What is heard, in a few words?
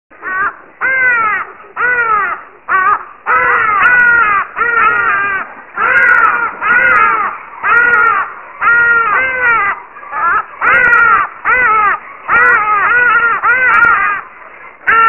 Hadidas